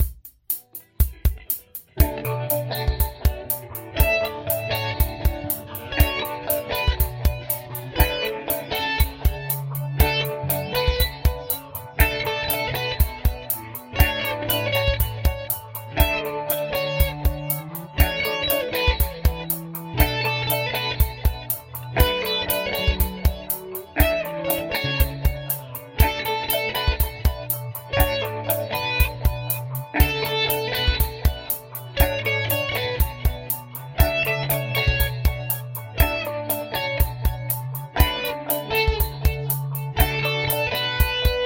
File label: flying in acoustic